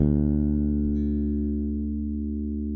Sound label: SPEC SUSTAIN, Bass